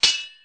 klonk; abilities

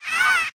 mandragora hurt, utterance

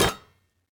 block; voxygen

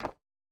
stone step, footsteps

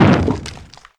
minotaur smash

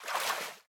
water splash, footsteps

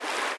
fast water, river sounds

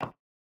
stone step, footsteps

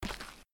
step dirt, footsteps

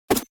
separated second swing

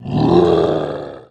gigas frost roar; abilities

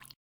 running water, river sounds

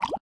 running water, river sounds

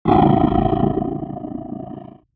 wolf angry

